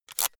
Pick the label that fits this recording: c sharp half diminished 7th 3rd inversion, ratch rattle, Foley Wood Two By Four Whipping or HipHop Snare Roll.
ratch rattle